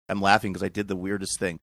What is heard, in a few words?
im laughing